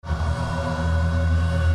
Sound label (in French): toit ouvrant